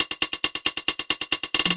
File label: 136 bpm, rollin hats, drum loops and breaks